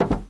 Glass footstep

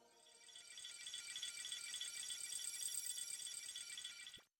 Intro Pod Startup Down

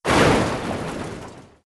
Run Intro Crash; Dino Donkey Dash

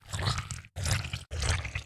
Slow Loop; Hollow Knight; Fatfluke Slow Loop

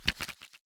Playing-Card Place

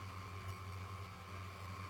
El-Hum-Dirty Loop; Escape

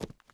Footstep Run Base

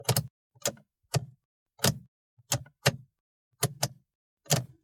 renault kangoo 1.6 16v foley rotation switch mono; NTG3; Unsighted

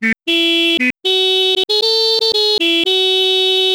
Car Horn Musical; lego jurassic world

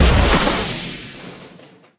Drone

